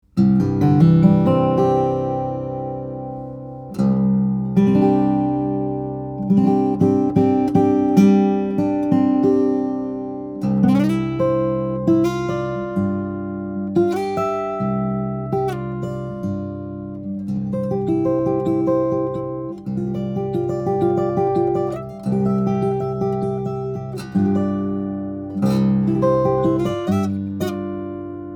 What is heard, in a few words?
guitars; Olson SJ; brazilian rosewood; cedar